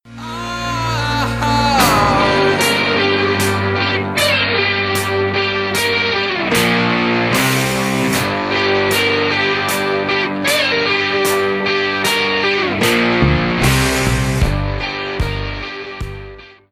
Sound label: Guitar & drums